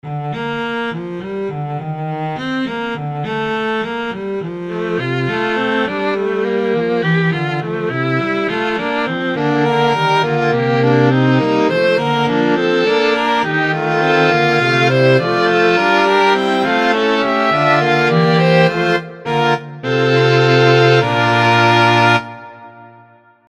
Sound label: muesli fugue